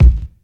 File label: Rich Lows Kick Single Shot B Key, Royality free bass drum sample tuned to the B note